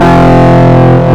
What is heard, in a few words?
guitar